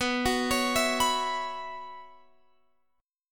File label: B Suspended 2nd Suspended 4th, Guitar, Open F; Bsus2sus4; arpeggiated; x,18,18,20,19,18